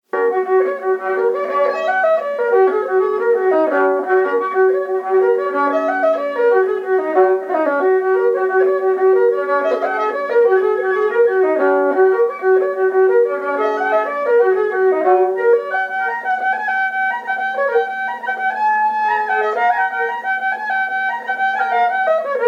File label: jig; Ships in full sail